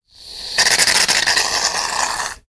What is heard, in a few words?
household shaving foam spray; sound effects one